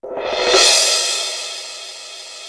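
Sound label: mallet roll, cymbal; East West; Global Sounds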